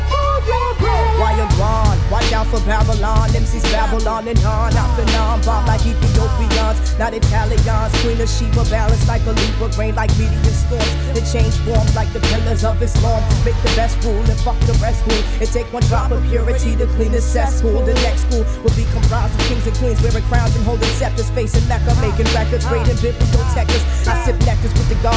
reggae

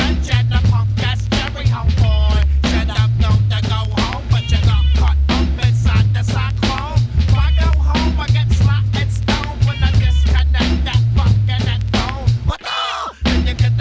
hiphop

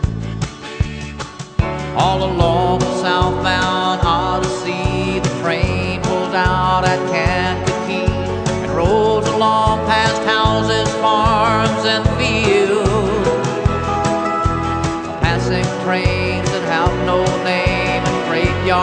country

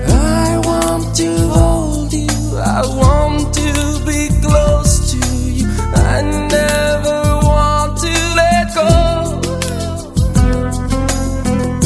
pop

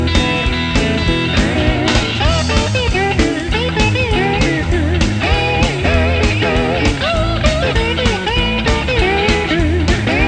blues

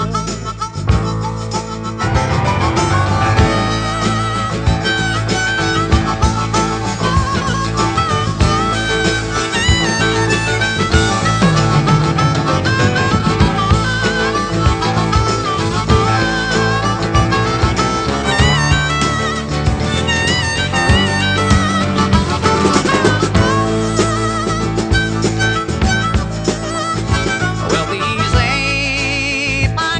country

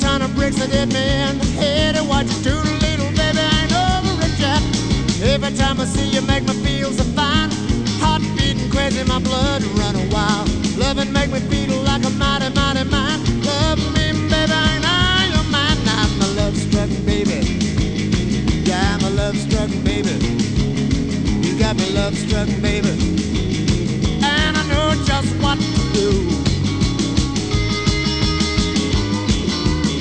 blues

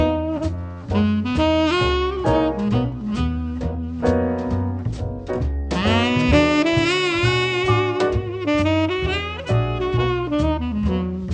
jazz

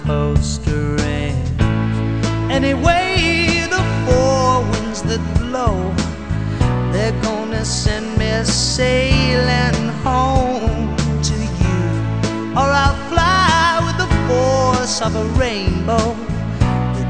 rock

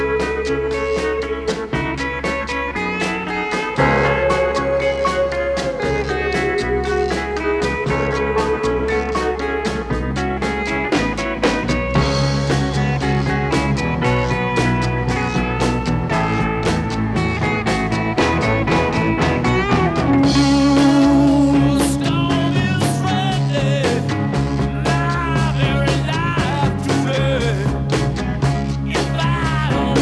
rock

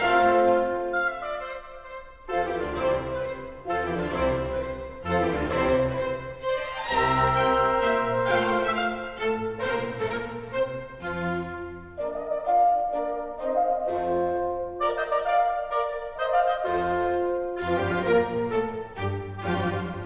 classical

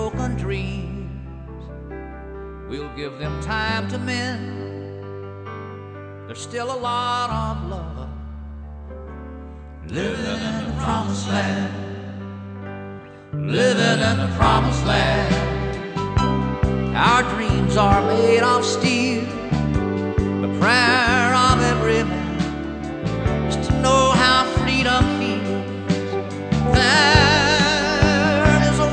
country

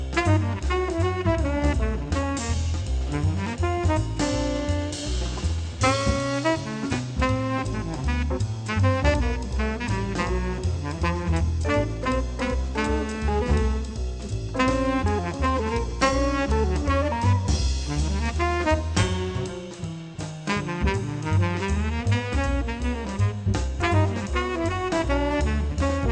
jazz

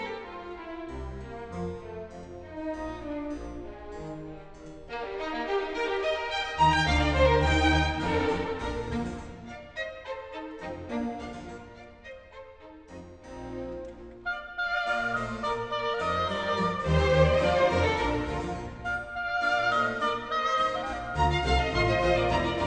classical

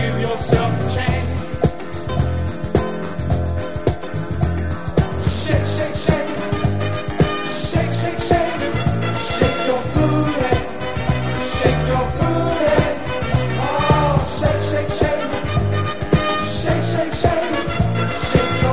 disco

